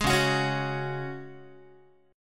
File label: Db Suspended 4th Db, Guitar; strummed